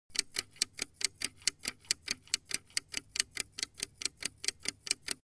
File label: Clock fast ticking